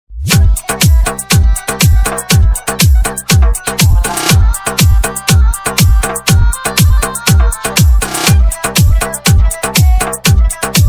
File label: english sweet music ringtone